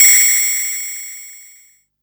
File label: Ride, Cymbals; Vengeance Samples